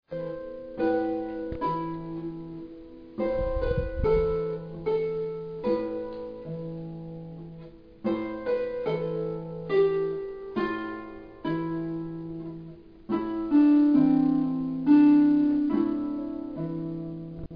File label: Harp Recital